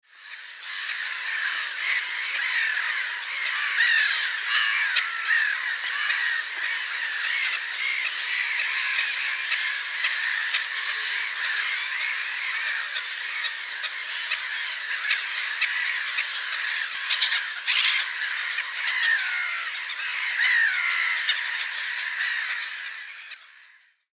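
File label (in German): Lachmöwe, Vogelstimmen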